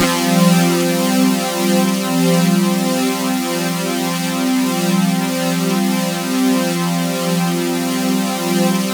C3, Synth Line; 90 s Sample CDs; NiceBeats; SynthLine VOL-27

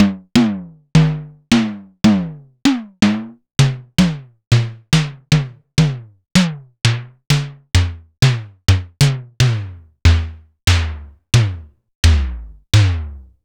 Simmons SDS5 sds5 misc; Sliced

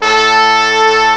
HORN, BRASS; M8; CMI Factory

